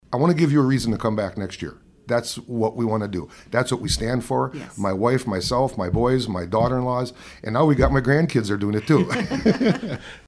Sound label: Drum